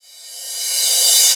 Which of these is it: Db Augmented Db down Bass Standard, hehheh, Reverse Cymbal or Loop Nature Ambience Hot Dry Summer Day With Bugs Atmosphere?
Reverse Cymbal